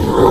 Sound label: mobs wolf attack; mobs redo